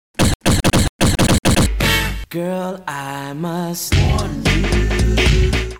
cough

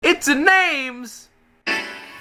windows 98 choir sound

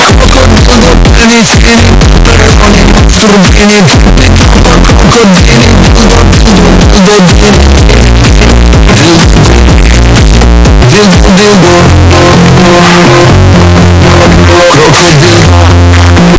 krokodildo bass

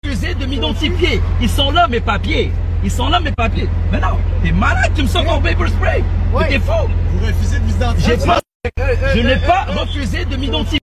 paper spray

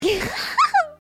cute giggle

